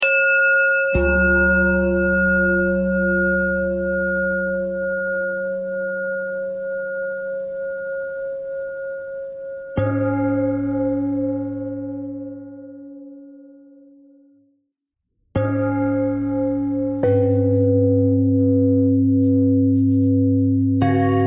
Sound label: bell bell thread simple, bells